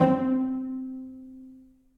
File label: Cello Section Pizzicato; Ableton; Orchestral Strings